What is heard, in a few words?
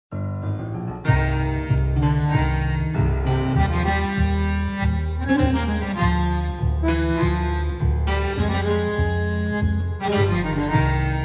Tango Apasionado; Prologue